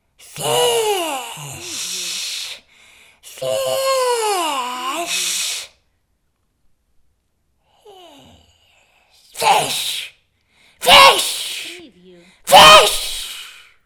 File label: Water Monster Scream